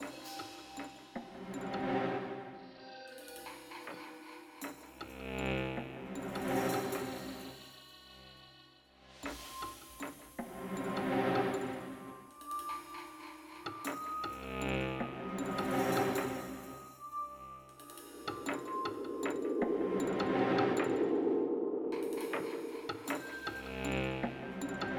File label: Suspicion Loop; We Happy Few